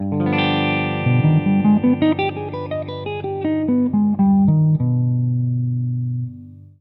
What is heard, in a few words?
scales Gmelo; A barp mM7